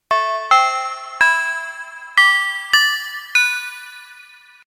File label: Chime; Chime Kiss; Kawai K1